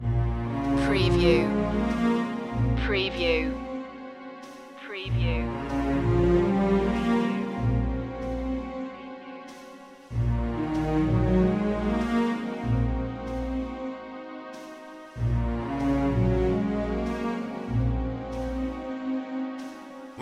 pensive feeling loop

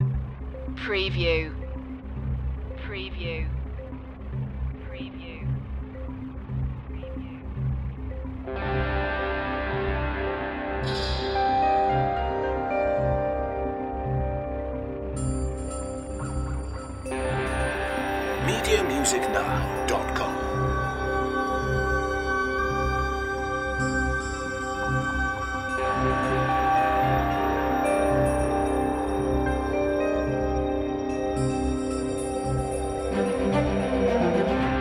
suspicious activities no perc bass

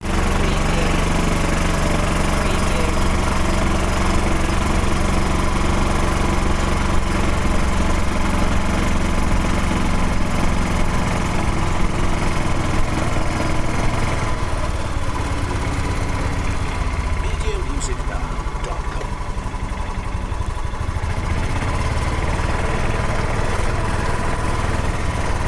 Golf Cart

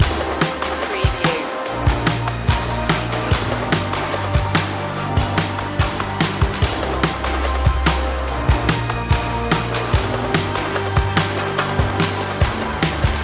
dark hero action loop

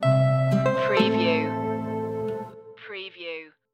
dreamy bells stinger

